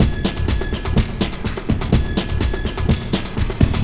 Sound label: ELECTRO ACOUSTIC KIT MELT, PROCESSED ACOUSTIC DRUM KIT; Sonic Foundry (Sony Creative Software); Vir Unis Infusion